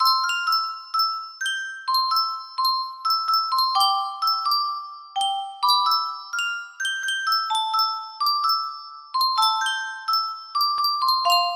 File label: Yunsheng music box, music box melody